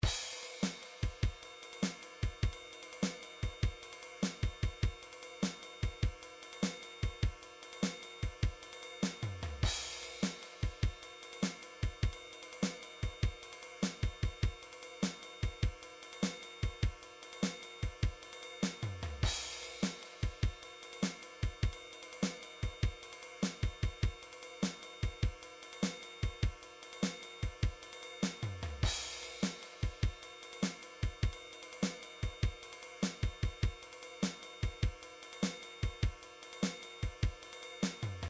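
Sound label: midi drums slow rock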